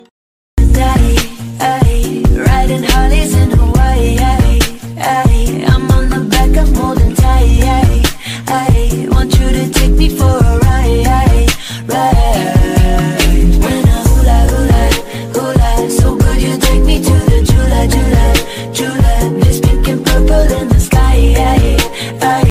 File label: english ringtone; english ringtones